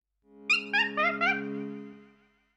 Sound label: desktop logout; sound-theme-pika